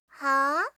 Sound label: haa, reaction, voice